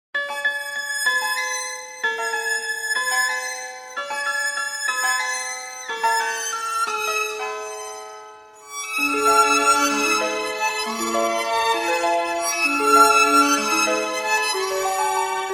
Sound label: sad music